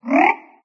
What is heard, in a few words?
cards new hand; uisounds; cardgames; There; ThereClient